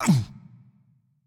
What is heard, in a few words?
hitreact large; smite; roman archer